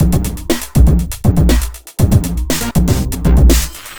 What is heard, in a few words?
kneedlewerk 120bpm, downbeat dance grooves